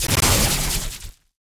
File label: Lightning Strike Aim Release; Evolve; Elder Kraken